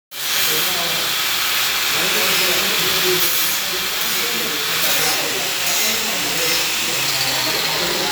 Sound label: regular grinder sanding disk in action; Hoof Trimming